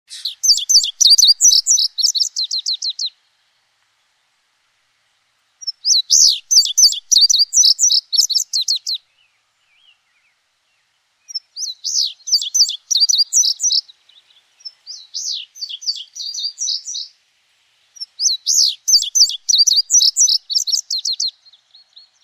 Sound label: indigo bunting